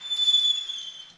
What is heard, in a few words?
Cage; New Super Mario Bros. Wii